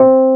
Hard EP E3; SoundFont - The Developer's Choice - Volume 1

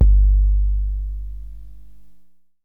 loop; LiveAct Pool; Drums; 808

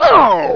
Gunner Death; BOOM

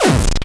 Radio Hit Descent; Transitional Elements

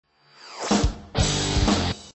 music start; lamb chop drop

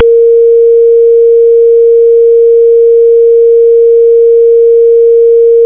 sine; CSP demo; Current Signal Processing

